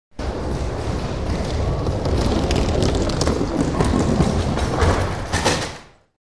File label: Bowling Ball Roll Fr, bowling